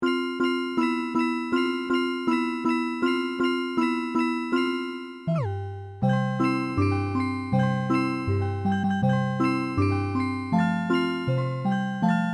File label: jingle bells